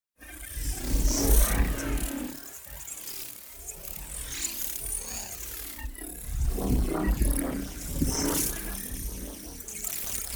ship engines; World Of Warships

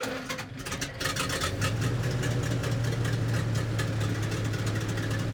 engines; World of Tanks

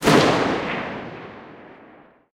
mg 8mm dt lastshot

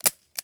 revolver dryfire; Rust

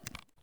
medkit throw syringe; Escape from Tarkov

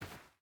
footstep hide sand walk; Rust

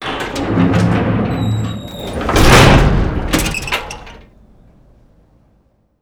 door hatch close; Doors; ModernWarfare

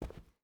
footstep rubber boots cloth run; Rust

